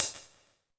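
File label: hi-hat, drums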